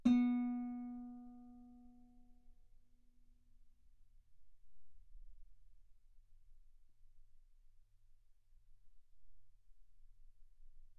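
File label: Guitar ff B string B3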